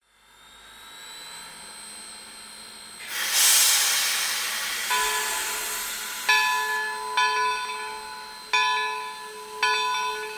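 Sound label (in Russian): паровоз уезжает без вагонов колокол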